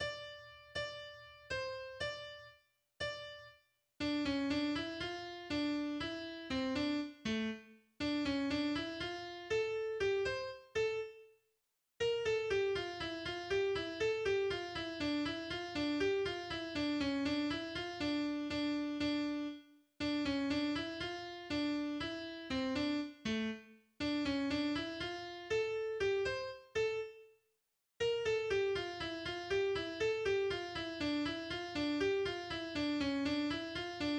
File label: Calypso melody